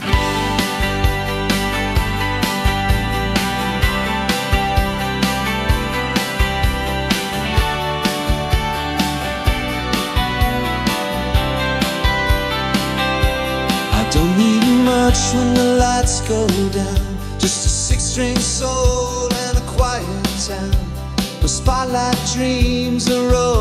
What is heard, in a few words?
jangle pop, Me and My Guitar